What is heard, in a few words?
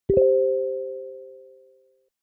Voice Sound Notification